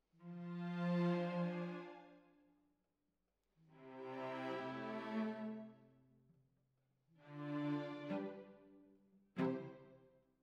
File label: Cellos Over